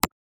Ui Series Basic Click

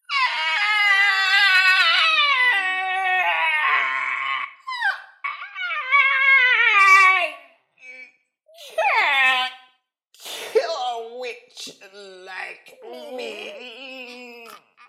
Scary Witch Dying

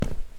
Step

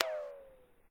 Ricochet

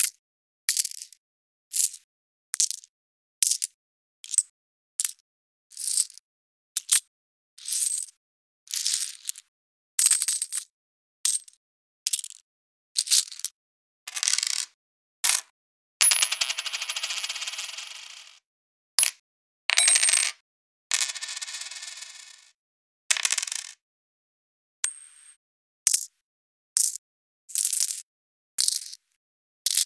Coins Money Drop Jingle Flick Shake

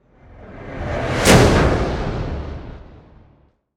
Riser Hit

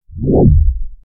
Zoom Sound Effect

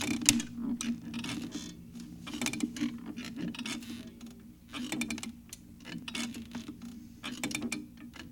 Rocking Chair

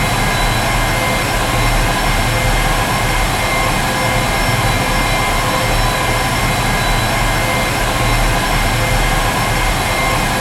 M1 Abrams Tank Idle Sound

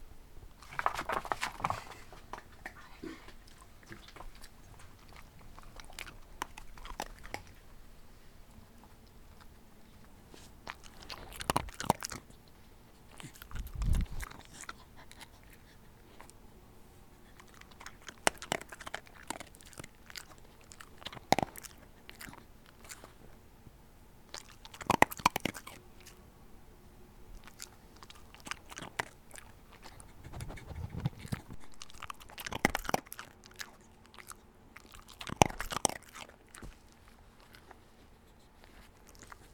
Dog Eating Snacks

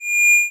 8 Bit Shimmer

